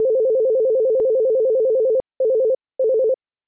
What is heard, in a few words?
ringer, ringtones